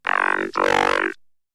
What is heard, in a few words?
Vocal; ZERO G - Datafile DISC 1